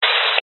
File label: technology cb radio static burst